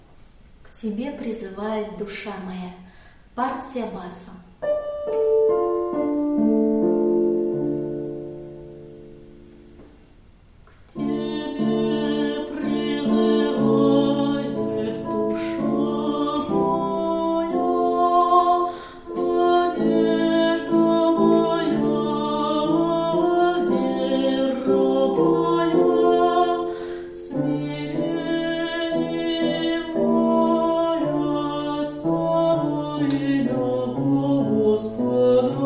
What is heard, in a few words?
bas; K Tebe prizyvaet dusha moja